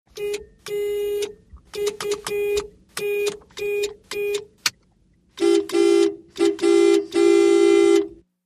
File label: 1970 Int Mazda Horn; 1970's int.